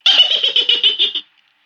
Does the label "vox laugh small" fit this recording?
Yes